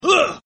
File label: hit; Power Rangers vs Teenage Mutant Ninja Turtles - Ultimate Hero Clash 2; galvanax